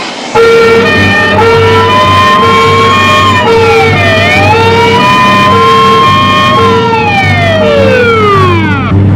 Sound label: Emergency Siren